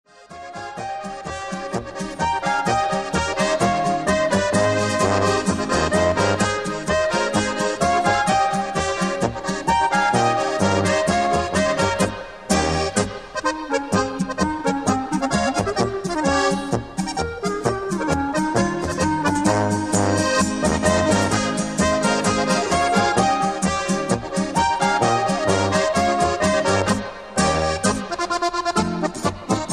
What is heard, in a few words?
polka